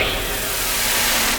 NOIS; XXL 800 Production Effects

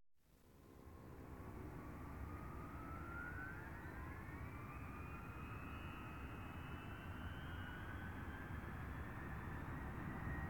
Aircraft Dc-10 Taking Off; KMP Film Video Sound Effects

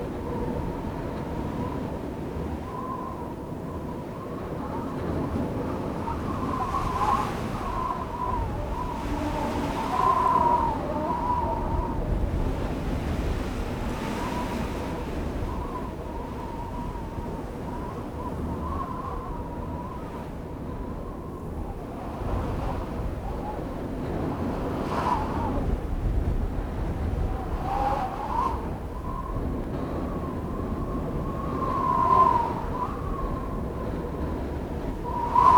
Wind thru trees; Inquisitor 3

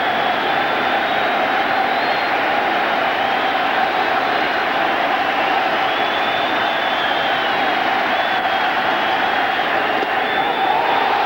Baseball Crowd Cheer Loop; Crowds

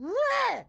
grandma short yell; Blind Drive

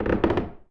CREAK Wood Hollow Deep Smooth; mono; Universal Sound FX

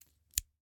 Scissors, snap, snip, shear, medium, single; Eiravaein Works; Vaeyan IV

